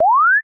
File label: string; tuxpaint; magic